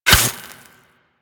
Melee Dagger Impact Robotic Flesh; Warframe; Weapons; Daggers

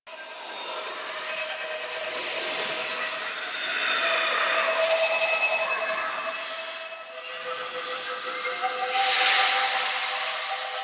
Lair Floating Rock Pillar Loop; Warframe; Ambience; Sentient

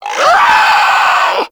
Infested Crawler Attack Short; Warframe

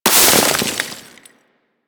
Melee Impact Snow; Warframe; Weapons

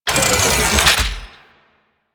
Excavator Drill Loop Stop; Ambience; Warframe; Infested Eris; Encounters

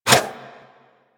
Dagger Whoosh; Dual Daggers; Weapons

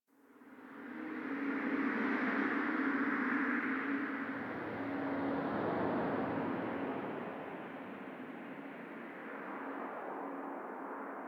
Dark Wind

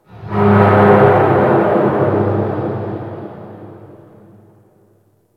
Ocean Low Creak; Ambience; Warframe; Grineer Ocean

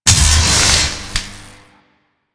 Alt Orb Zap; Weapons; Warframe; Tenno; Grimoire

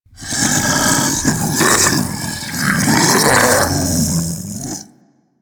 Infested Splitter Joined Vocal Idle; Warframe; Grey Strain

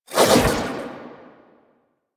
Archon Whip Spin Whoosh; Enemies; Weapons; Warframe; The New War; Nira

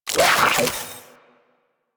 Pistol Reload; Sentient; Crp Sent Amalg Pistol

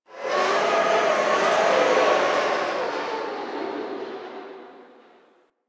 Rail Jack Destroyer Machine One Off; Ambience; Crew Ships; Grineer

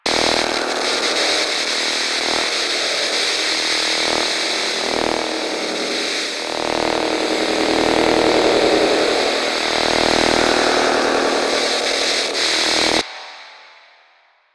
TV Hum Loop; Ambience; Props; Warframe; Nineteen Ninety Nine

